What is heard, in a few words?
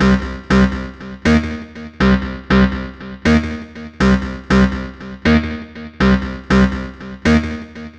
120 C synth, Synth Loops, Trance; Trance Explosion Vol1